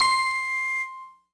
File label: Ding